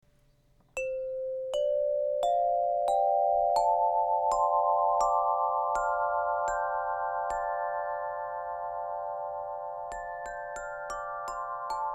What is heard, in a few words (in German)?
klangbeispiel roehrenklang